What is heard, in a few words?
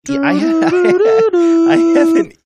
singing quick musical button